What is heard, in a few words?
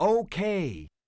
percussion, Roland TD-20